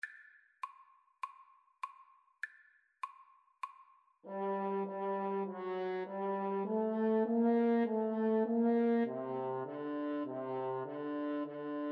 French Horn Duet; bach aria

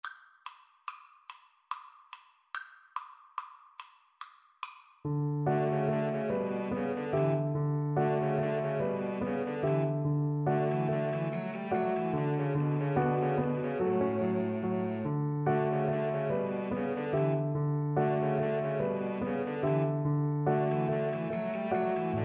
violin cello, Violin-Cello Duet; lakme

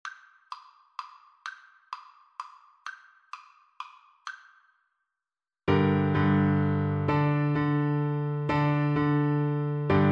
pirates, Bassoon

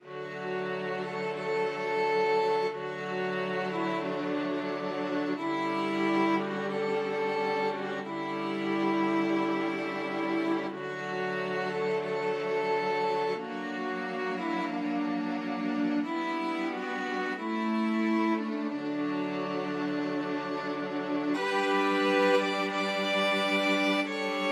largo, string quartet; dvorak

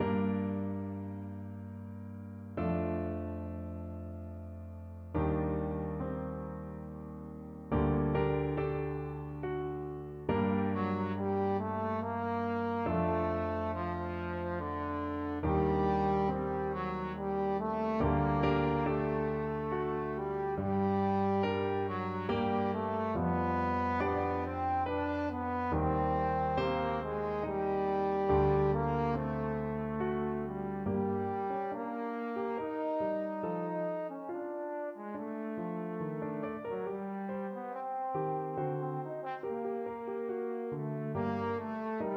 trombone

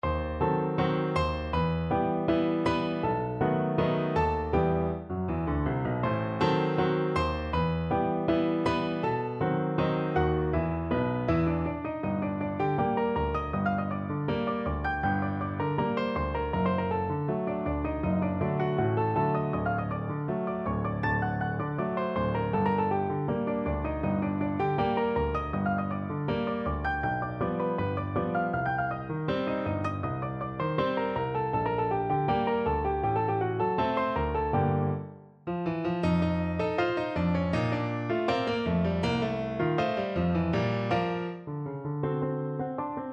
el choclo, piano duet

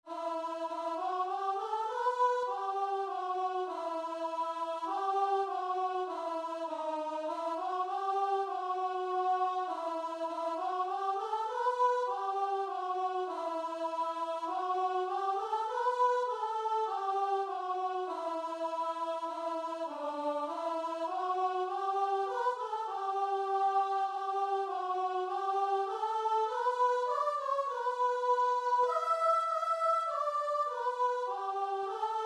aberystwyth, guitar and vocal

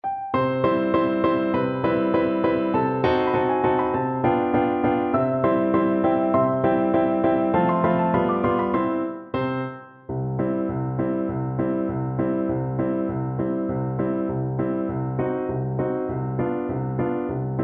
horn, French Horn

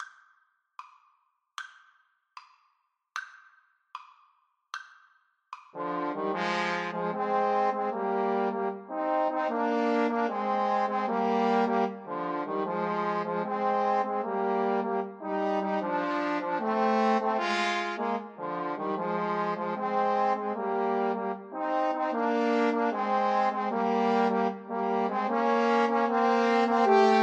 trombone trio

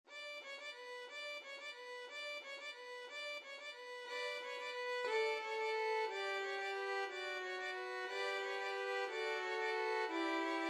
flexible mixed ensemble 4 players

